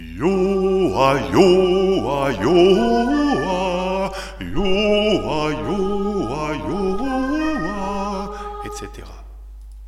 Vocalise

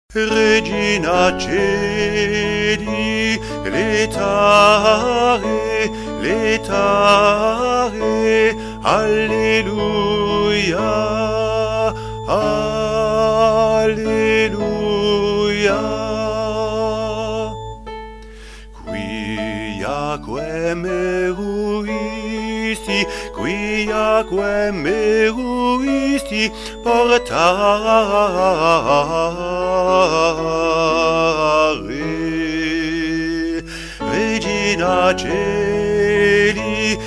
Regina coeli

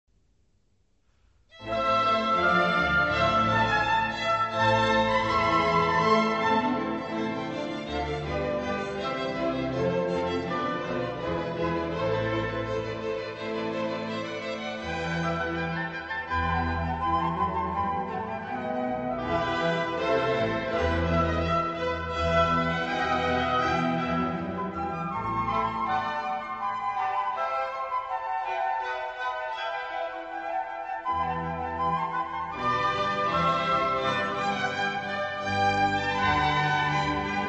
orch; Kuhnau

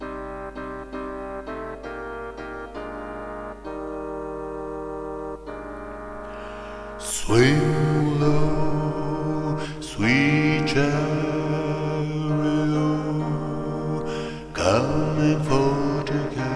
Swing low

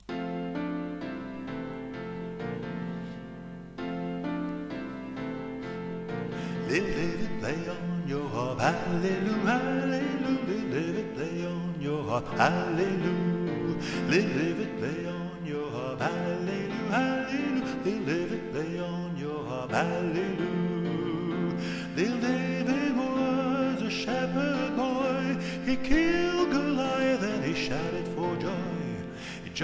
Little David solo

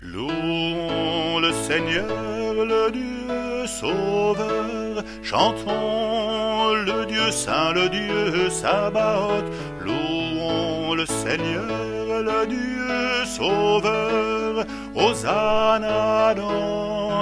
Te Deum; Charpentier

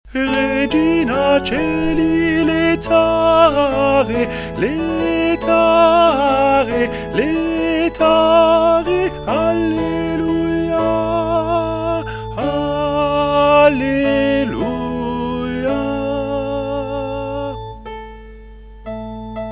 Regina coeli

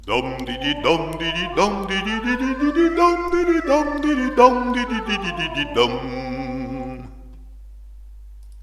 Vocalise

